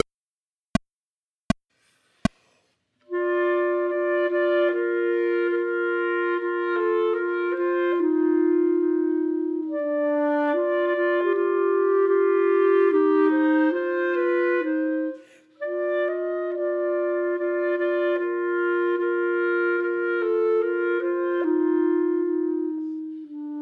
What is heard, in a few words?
Entracte Chamber Music for 3 Clarinets minus 3rd part; Schubert; Chamber Music for 3 Clarinets Vol I